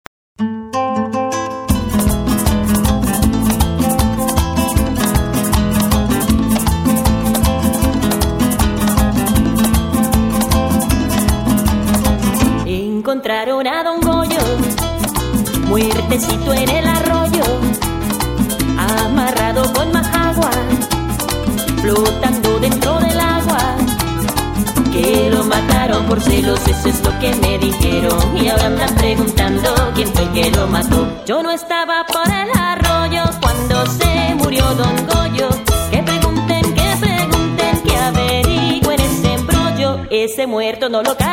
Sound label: latin fire